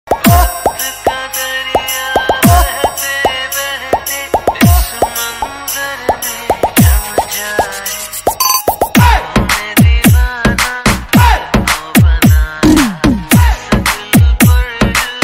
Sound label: romantic song ringtone